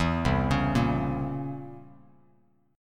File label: C 7th Flat 9th C down Bass Standard; C7b9 arpeggiated; JGuitar